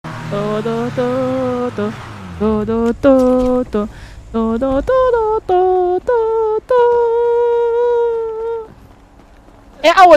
baby

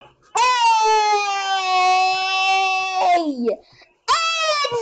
eyyyyyyyyyyyyyyyyyyyyyy

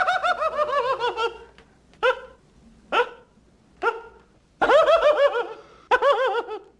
laugh; amadeus

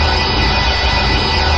tie engine; lego star wars

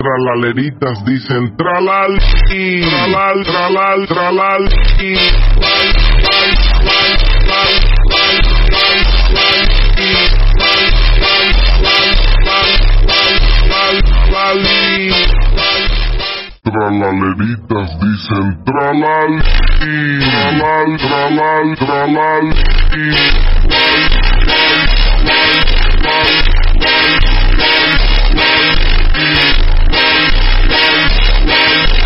phonk; roblox

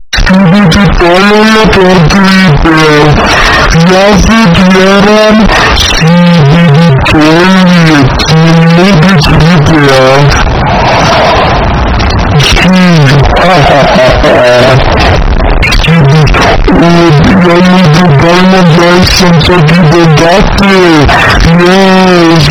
toilet loud

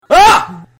scream; gauze

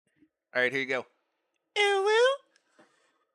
uwu kitten

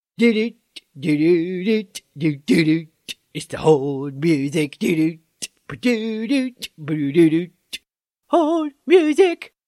hold music

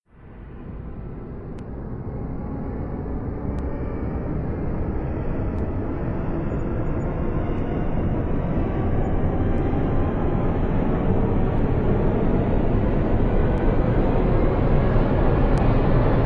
panic horror build up sound

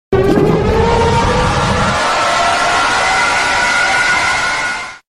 scream

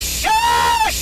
shoosh

